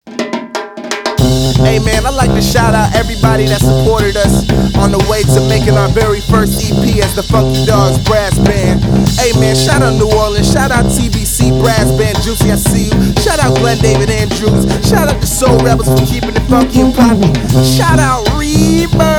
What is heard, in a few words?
Brass Band; Intro